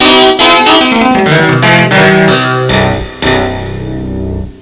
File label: SYN RIFF